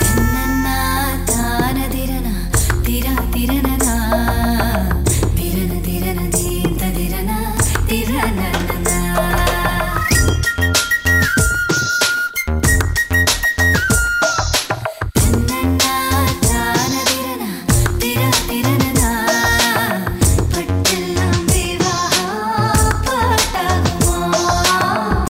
Jingle

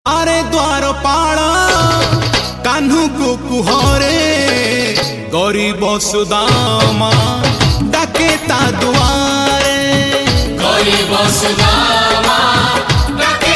oriya bhajan

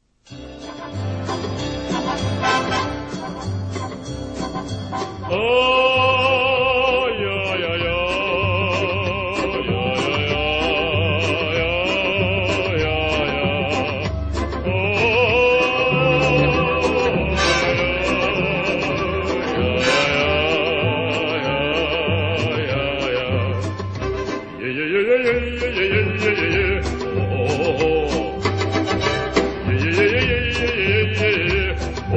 weaird russian singer

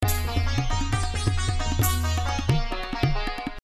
sitar with tabla; classical

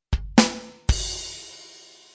Drum Start

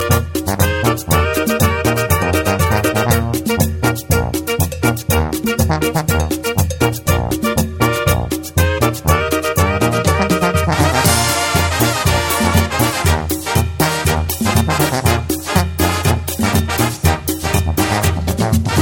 guitar

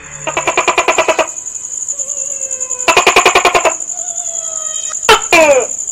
thai msg; tokto

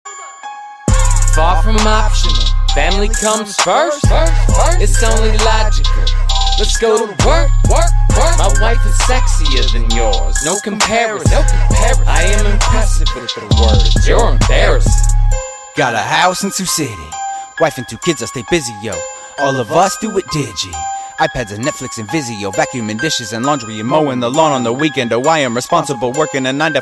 trap beat

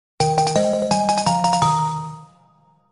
Key item; Pkmn

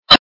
chinese calm sweet music ringtone

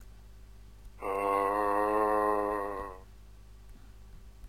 zombie moan; games; ringtones

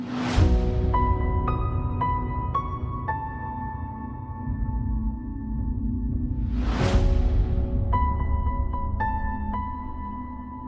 Piano

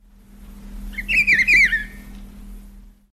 Bird

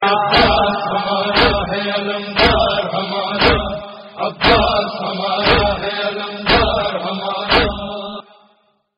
metal cover

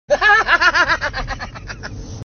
Devil Laughter

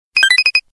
Notification

